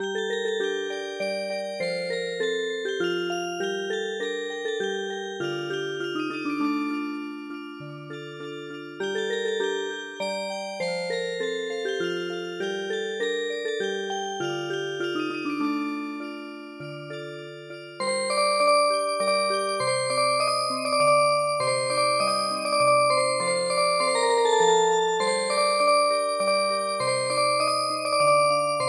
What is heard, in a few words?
Marsi Music Box; midi; traditional